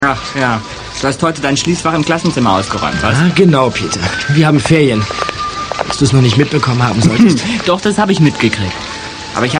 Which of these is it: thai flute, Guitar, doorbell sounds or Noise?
thai flute